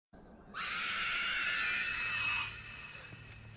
nazgul scream

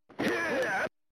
grunt; final fight

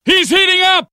heating up

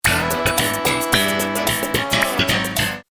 sitcom transition bass riff; seinfeld

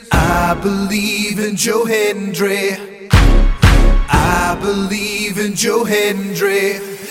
clap clap

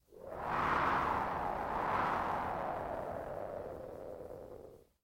spooky ambience

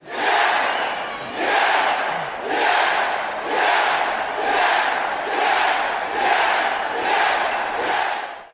yes chants

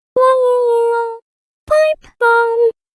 woah pipe

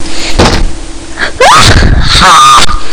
sudden scream

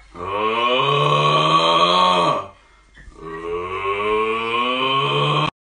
aughhhh

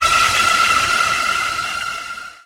jumpscare sound; five nights at pizzas 3

